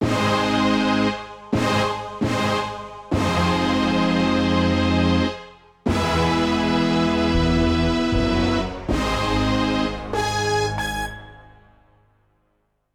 Big Orchestra, Yamaha TX16W; Orchestral Performance 1

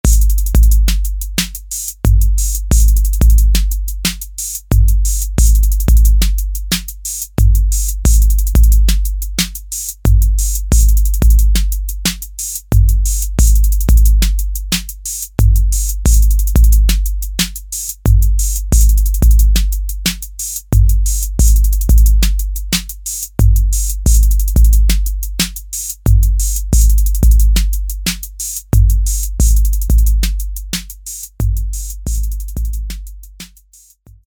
Rhythm, Roland TR-808; Factory

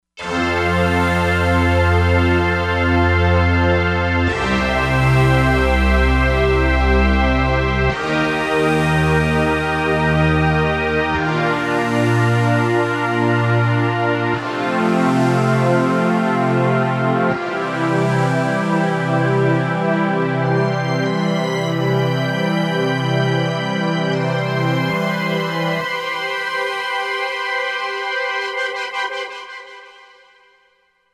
Air Synth, Korg MSC-07S Synth; Korg MSC-07S Synth 2

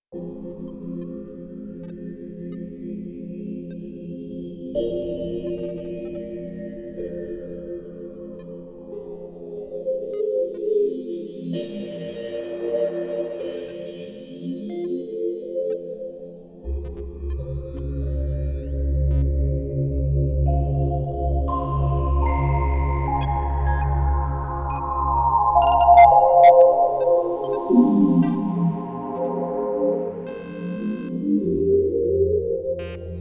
Korg Radias-R

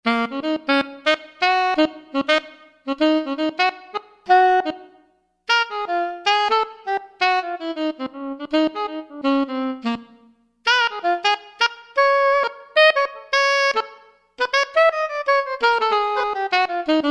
Alto Sax Vel; Roland SRX-03 Studio SRX

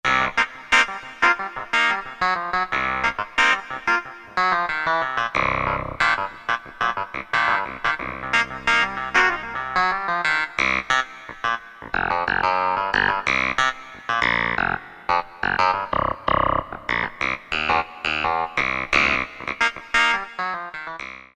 CLAV, Korg DSS-1

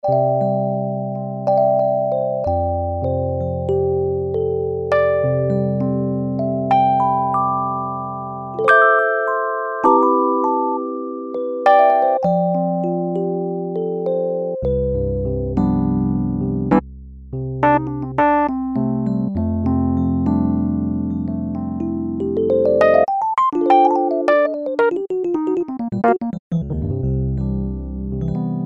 Rhodes Electric Piano; synthesizer; Guess the synthesizer game

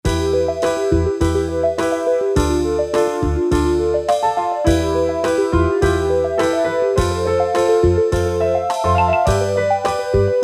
Slow Rock, Casio CT-370, Rhythms

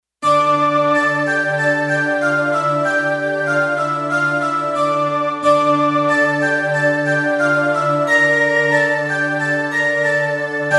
Harpsi flute; Digital Synthesizer

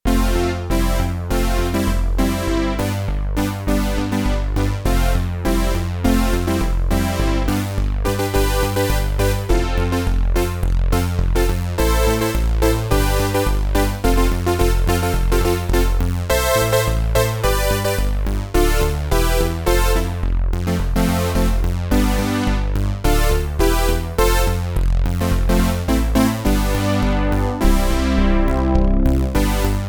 Polysynth, Roland JD-800; Factory Patches

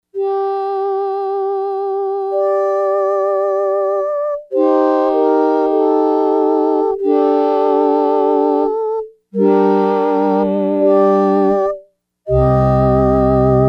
Voices, Yamaha DX100; Factory Patches

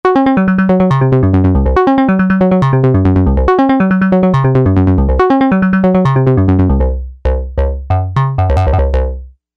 dig Arp

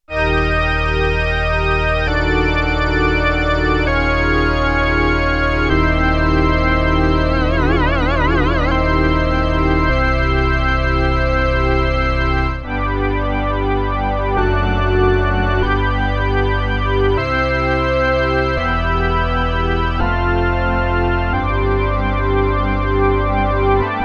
Pipe Organ, Roland JX-8P

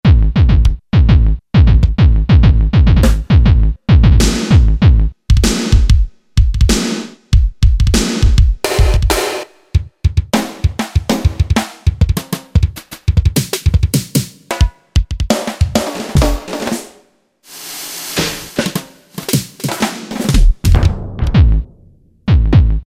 KICK & SNARE, Factory Rhythm Sets, Roland SC-880

